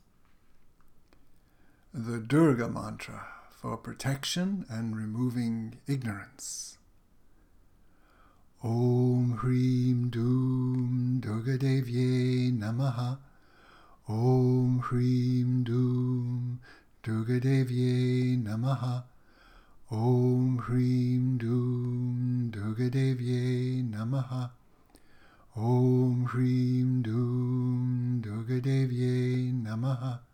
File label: Durga Mantra